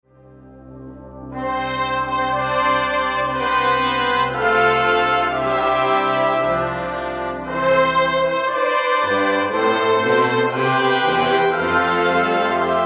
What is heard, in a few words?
Fanfare